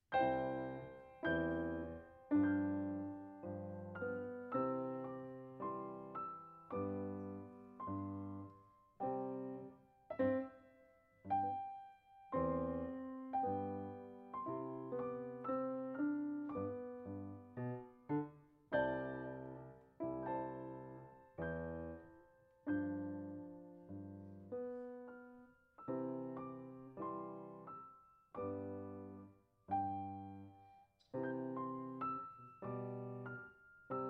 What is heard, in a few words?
Trumpet Song; Clarke